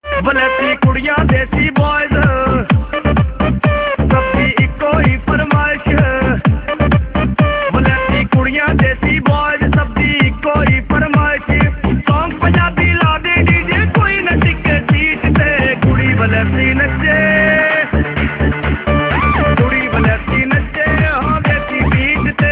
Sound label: Desi Beat